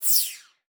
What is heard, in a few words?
Multimedia Internet CD-Rom Flash Blast